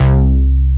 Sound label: earcon